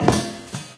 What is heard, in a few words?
metal grate, footsteps